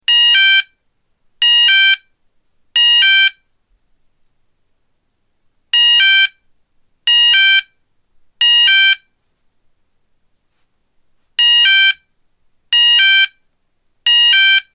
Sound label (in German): Ton